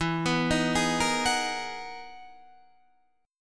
E-Major Sharp 11th E, Guitar DADGAD

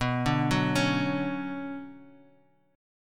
B Minor Add 9th B, chord; Bmadd9 arpeggiated; madd9:1,b3,(5),9; JGuitar

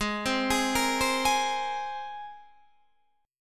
G sharp Major Add 9th G sharp, Guitar DADGAD; G#add9 Chord

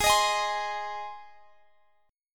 G sharp Major; strummed; x,x,18,20,21,20

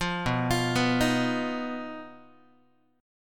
B Major Flat 5th B down Guitar; BMb5; arpeggiated; 8,7,8,9,7,x

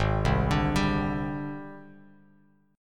Ab Major Sharp 11th Ab down Bass Standard; AbM#11 arpeggiated; JGuitar

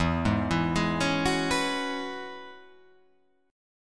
E 6th Add 9th E down

